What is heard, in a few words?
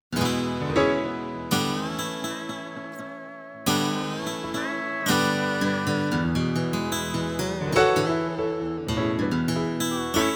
pickin